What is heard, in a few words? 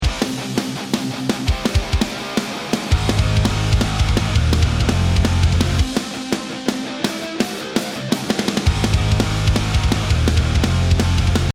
start round